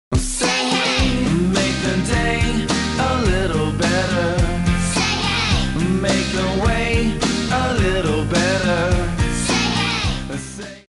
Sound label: ringtone babies